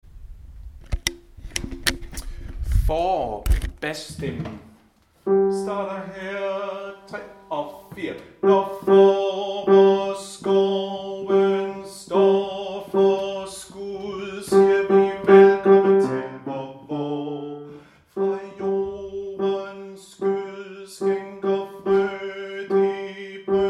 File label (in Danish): bas